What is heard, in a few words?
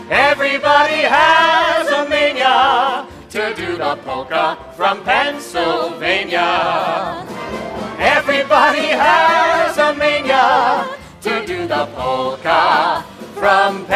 Polka